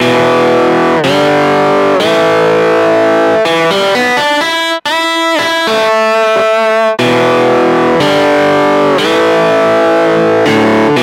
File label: E Guitar EHX Soul Food